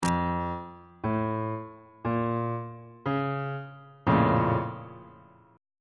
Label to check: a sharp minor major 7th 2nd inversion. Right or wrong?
Right